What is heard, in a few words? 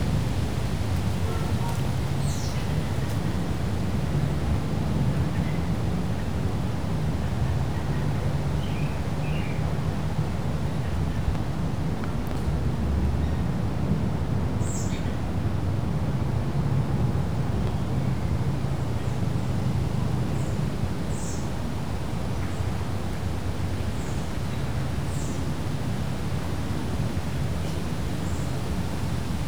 Park busy; ambient; Project Gotham Racing